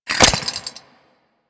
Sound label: Seance Activate; Warframe; Harrow; Donda